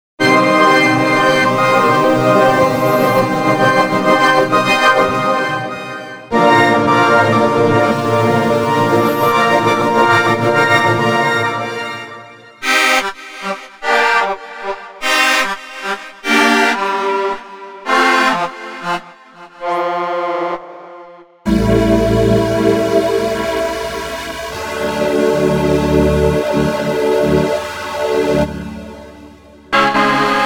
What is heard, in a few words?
organs